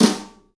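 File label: New Brush Snare Flam; Snares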